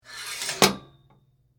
Hanger Slide Hotel, Household, Hanger, Slide, Hotel, Closet; Bedroom (Sound Effects:Household)